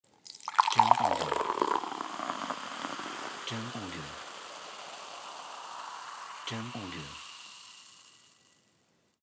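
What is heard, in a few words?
Pouring Coca Cola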